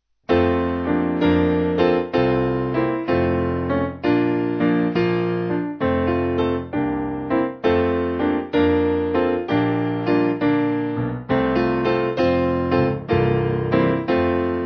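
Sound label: Piano, church music backing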